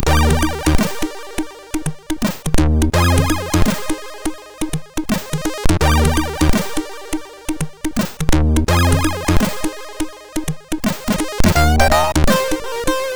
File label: Shuffle, MOS6581R2